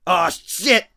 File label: hurt medium; Escape from Tarkov